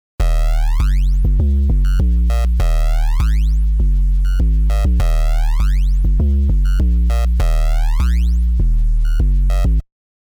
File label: shaperbox crush bass